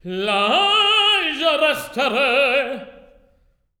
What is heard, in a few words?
TEN. TRAD; Spectrasonics; Symphony of Voices